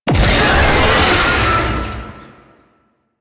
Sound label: Lasrian Tank Infested Vocal Pain; Enemies; Barks; Warframe